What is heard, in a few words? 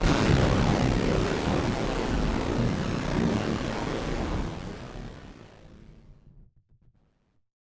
Malfunction Synth, malfunctioning synth samples